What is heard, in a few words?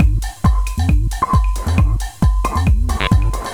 Drums Techno